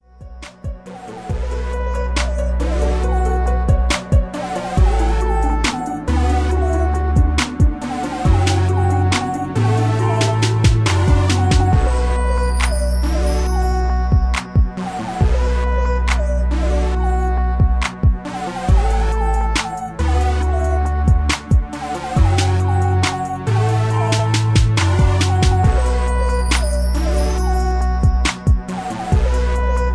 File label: 69BPM